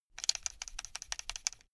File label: buckle rattle short fast